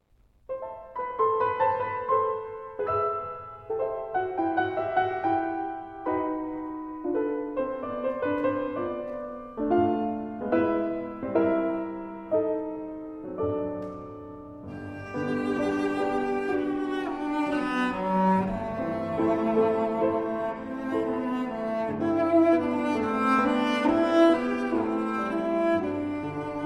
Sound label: Piano Trio in G Major Andante espressivo; Debussy; Furuya Trio